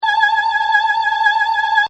Opera Female Voice

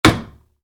Wood, Light Impact: Plastic; Materials; Stuart Duffield

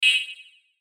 BUZZ ERROR; Buttons; Multimedia; Blastwave

